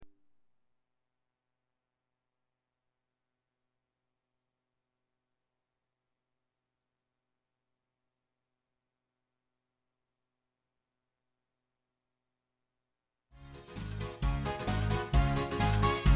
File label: music